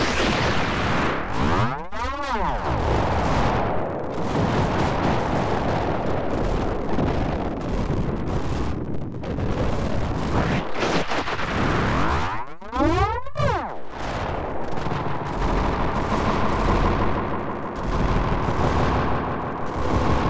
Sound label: glitched wings, fx